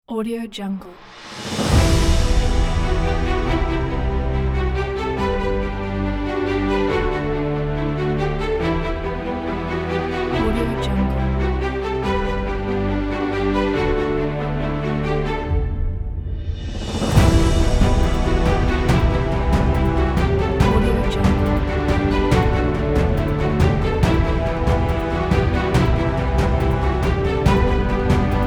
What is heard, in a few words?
cinematic; anuch